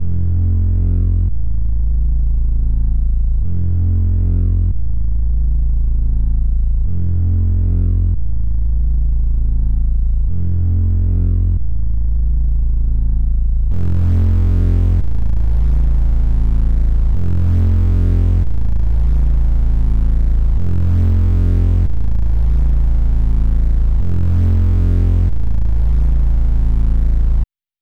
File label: 140 BPM A Min Bass; Cymatics - 2022 Melody Collection - Wavy; Vapor